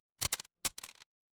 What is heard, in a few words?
mechanical gear